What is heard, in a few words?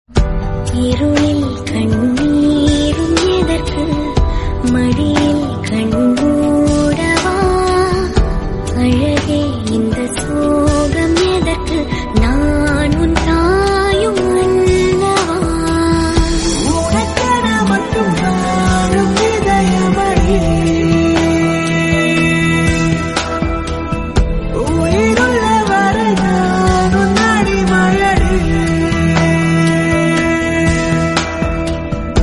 Female Song Ringtone